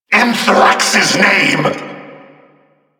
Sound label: attack vocal; Warframe; Duviri; Dax; Vocal Set B